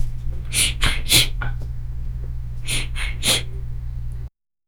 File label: a man sniffing sound; vocalization